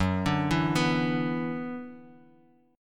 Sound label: F sharp 7th Suspended 4th Sharp 5th, Guitar, Drop D